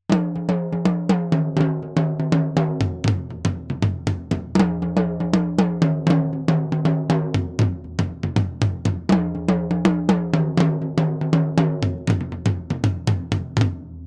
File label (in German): V Beat Toms 90° Winkel; sE Electronics